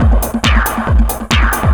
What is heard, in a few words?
138, Techno, drum loops